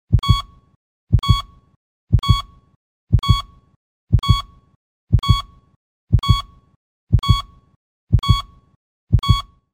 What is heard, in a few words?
Heartbeat